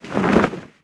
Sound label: Banner flap; XBOX; Amped 2